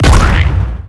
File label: Pie Explode; Nicktoons Nitro; arcade